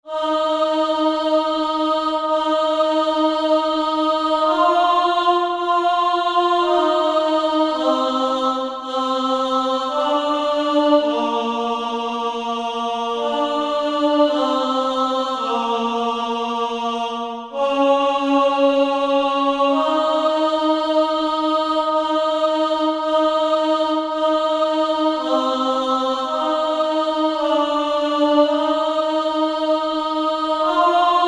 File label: alto